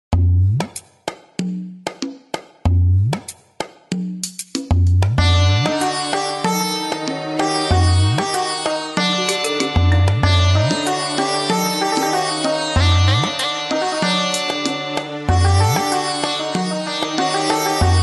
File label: Music; Rhythm Heaven Megamix